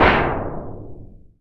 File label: Thunder Sheet Hit; Digital Sound Factory Virtuoso